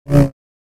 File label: Saber; FXHome